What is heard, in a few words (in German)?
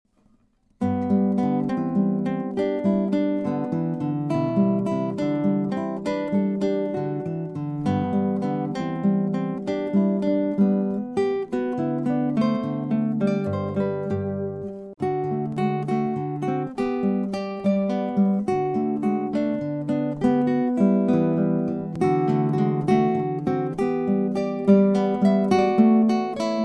Taenzlein duett; Gitarrenmusik